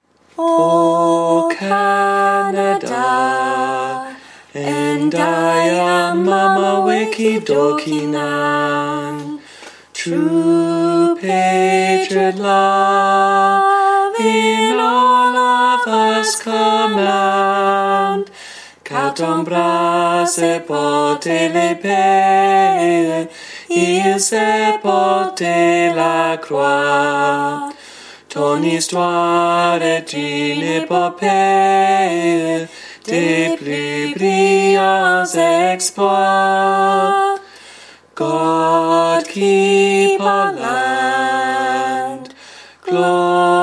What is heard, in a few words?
O Canada Algonquin English French